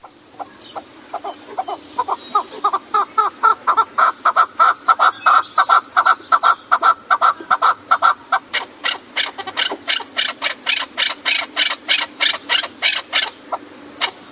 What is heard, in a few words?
Chukar, songs